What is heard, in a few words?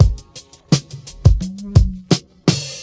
85 bpm old school breakbeat f sharp key